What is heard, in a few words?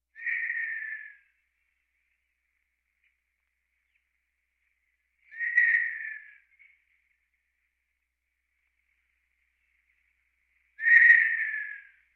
Birds